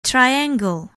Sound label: triangle